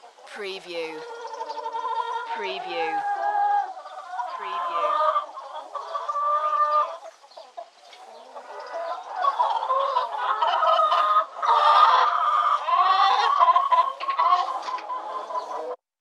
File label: Chickens Talking Bizarre